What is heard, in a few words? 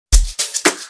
Amapiano Live Balcony Mix